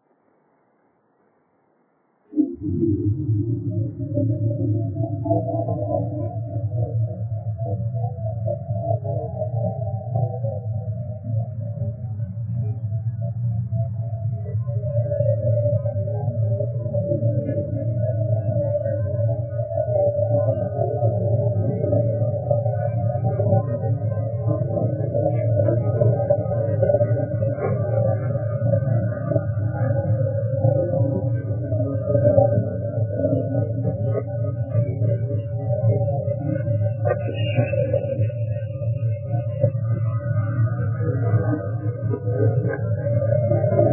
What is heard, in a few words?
chant; pele; nature